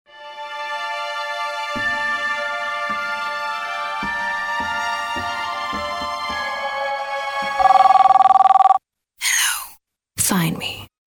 Hello, ringtones; Oppo Find 3 X905

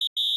Referee, notifications; Samsung; Galaxy A56; Fun

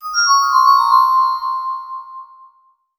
E-mail; X1 Family 2

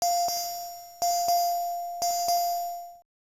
cam Ready; KV510; LG

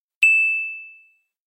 Ting, notifications; SU880 Optimus EX; LG

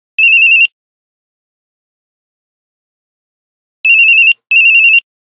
Nokia Buzzer; Windows Phone apps

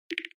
Keypress Delete; Alcatel Pixi4-4

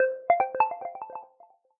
Electronic, notifications; Honor X9c

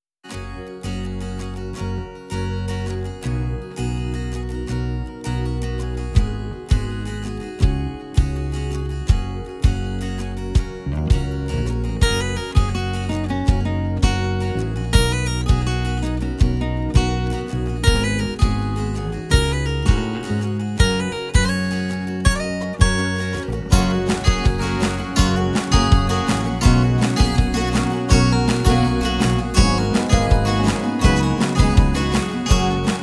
Morning call; Gome U7

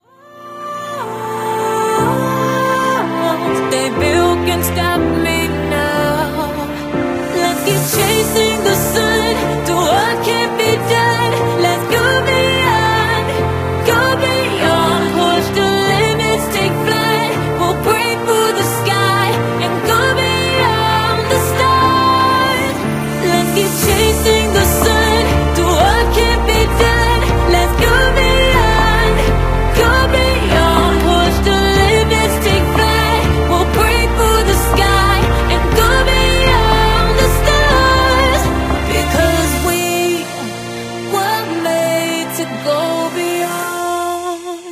Female, ringtones; Honor; MagicOS 7.1

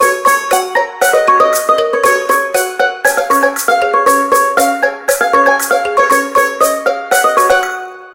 Grassland Dance, ringtones; Huawei; Ascend P6

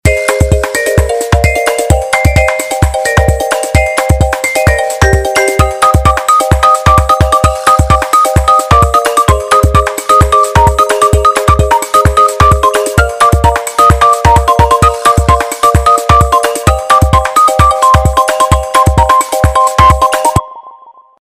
Running; Qiku Luna Youth

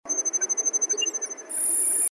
front camera science fiction down; Allview Soul-X6-Xtreme

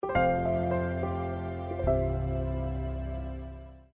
Closing Sound, power off; ZTE F451

